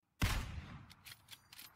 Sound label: kar98 sound; pubg